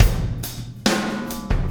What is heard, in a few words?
Impact Crater Beat, Processed Drumkits